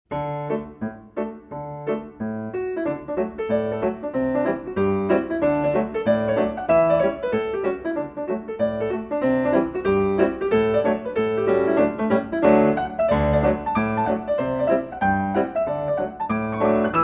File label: Allegro Galway Hornpipe Trad. Irish